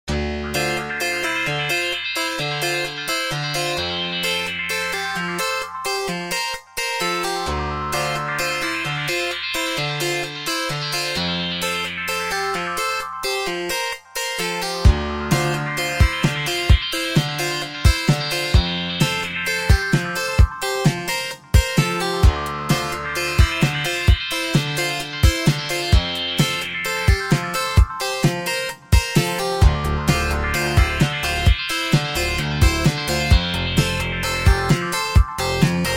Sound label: DEXED DX7 SCAN